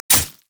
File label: crescent slash; LoL; akali